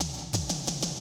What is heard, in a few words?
Classic DrumLoops